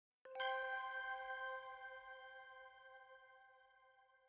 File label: item Jingle Chime Only C